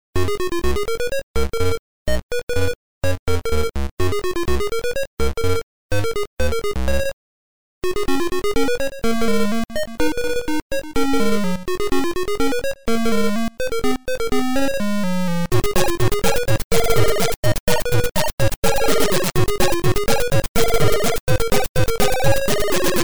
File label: 8 bit cover; kalambur